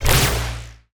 Laser HIT heavy; SFX; Cielonos

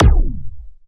ZAP Deep mono; SFX

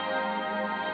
light loop; Loops; MagicArsenal

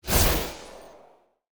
Ice Magic Projectiles; RPG; SFX; Cielonos

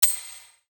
hard flick; SFX

